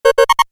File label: Computer Beep, Short; Multimedia; Stuart Duffield